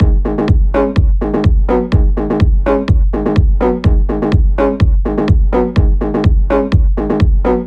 HOOK KICK

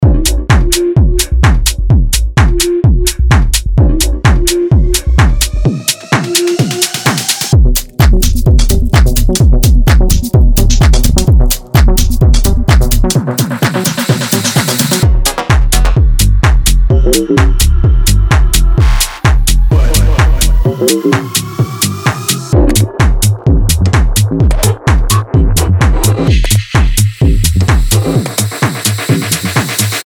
Soundbed; NightFunk; Trademark Series

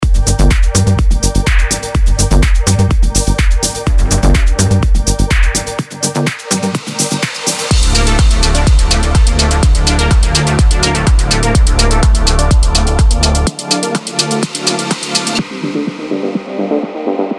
Melodic House Techno Soundbed; Toolroom

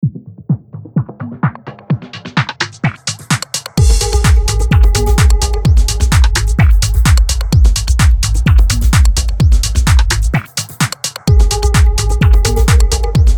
Minimal Deep Tech; Toolroom; Minimal Deep Tech Vol. 2

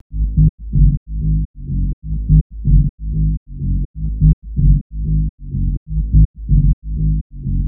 BASS LOOP LAYERED

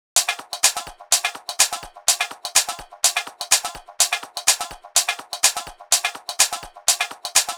PERC 2 GATED

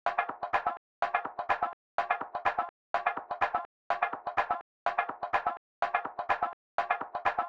PERC 3 LOWPASS

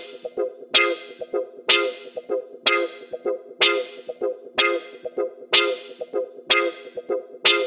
Original Guitar Loop

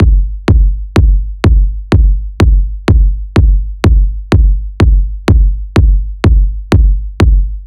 Kick

